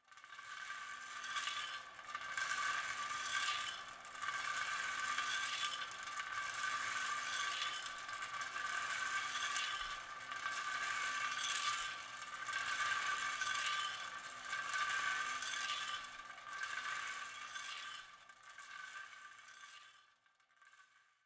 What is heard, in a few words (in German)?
Keramikmuseum Trommelmühle Detail; Keramikmuseum Velten